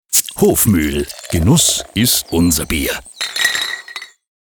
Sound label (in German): Soundlogo; Hofmuehl